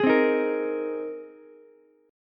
Bb 7th Suspended 2nd Suspended 4th Bb Ukulele, chord; strummed